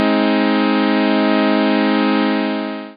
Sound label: triad ET; third